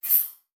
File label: Multimedia Internet CD-Rom Flash Wipe Short